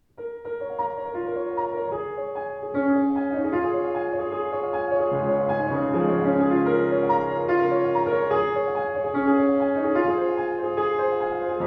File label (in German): Schimmel Buche, klavier; 115 schimmel buche